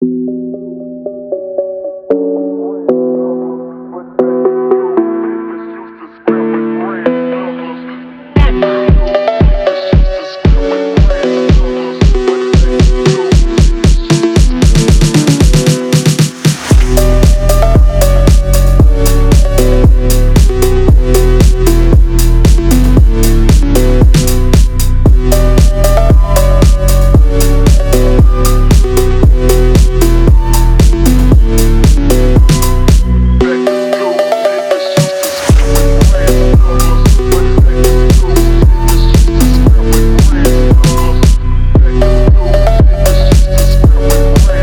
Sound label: phonk remix